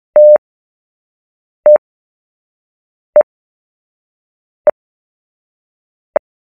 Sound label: short note